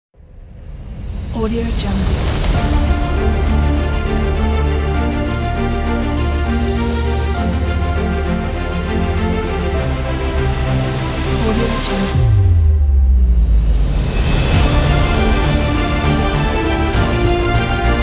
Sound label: epic opener